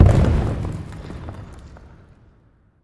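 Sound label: dull distant impact of a howitzer shell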